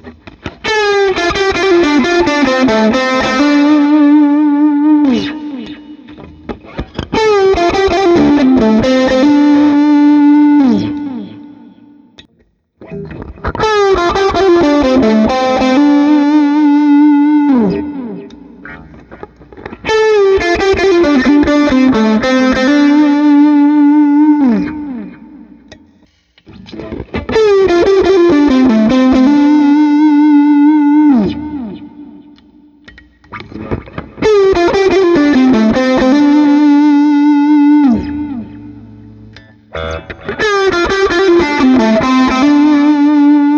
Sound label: Guild BHM1 All Switches; Bohemian; 1985 Guild Brian May BHM1